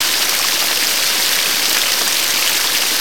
waterfall layer

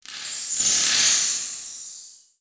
bats pitched; ninjago

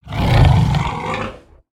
Slam Growl; creatures organic

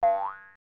spring; build mode

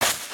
Footstep Sand; creatures pets

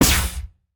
Barrier Shock, ambience spaceship

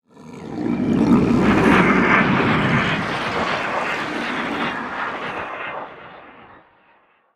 dark jungle oneshot

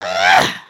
flex; emotes

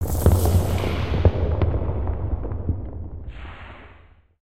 Gate Chamber Earth; ninjago

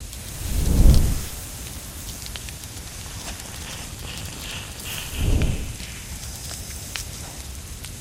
Attic Fire Tile; Ninjago

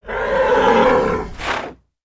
Pet Buffalo Angry; creatures pets; Lego

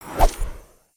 Summary Whoosh; aronwk collection; ndaudio

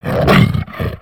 Pet Lion Roar; creatures pets

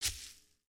Foot Grass Walk, footsteps

